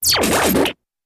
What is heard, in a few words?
Synthy Beeplike Zap, zaps; Synthy, Beep-like Zaps ( Last One Has Buzz & Pulsing Sensation )